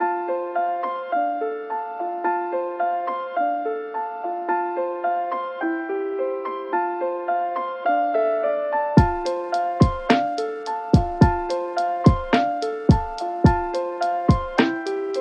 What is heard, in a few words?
instrumental